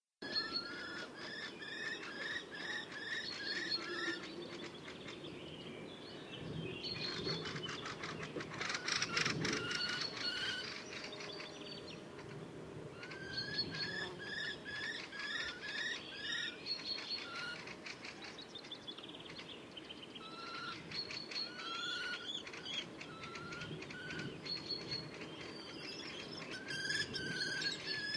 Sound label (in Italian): canto gheppio